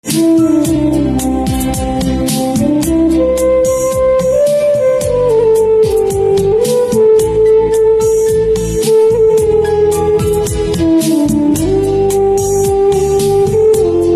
Flute

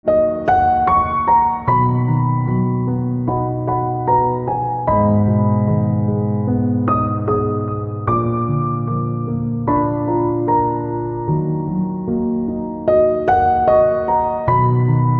Piano, instrumental ringtone

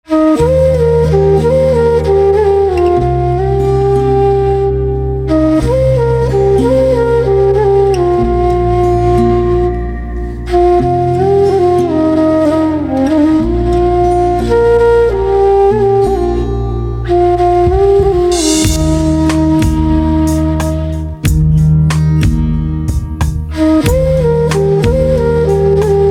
Flute

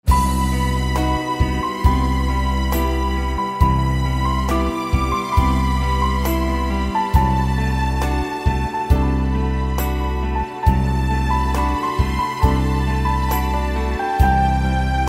Piano with Violin

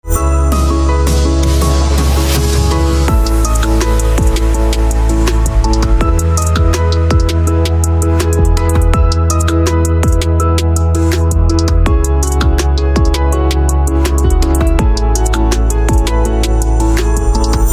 Piano Instrumental Ringtone

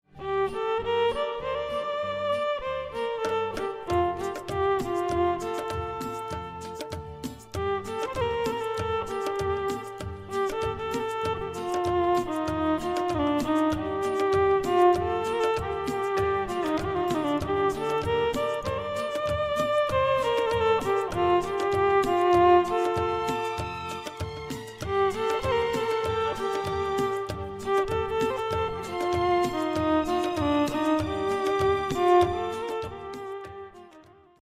Violin

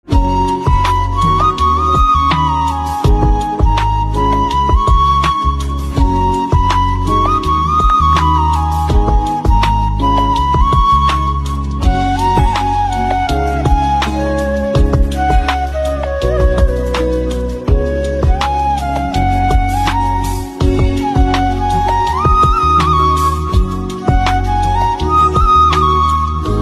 Flute

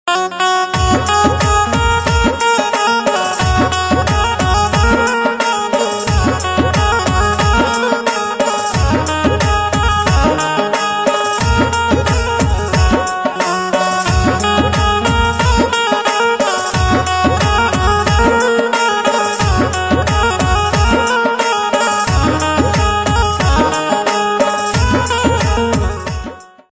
Banjo

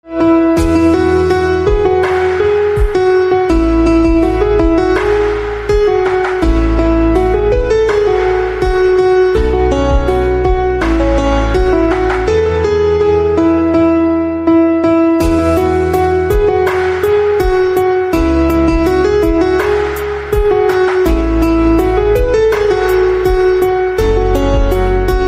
Piano, instrumental ringtone

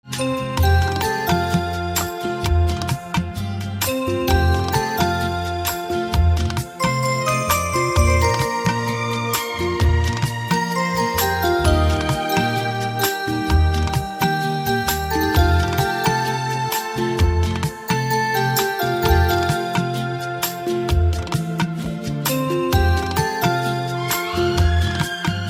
Xylophone

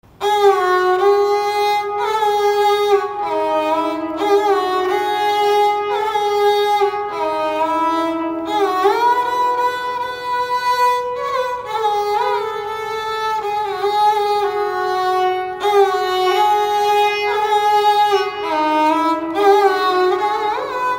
instrumental

